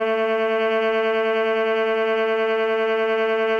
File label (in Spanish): Tremolos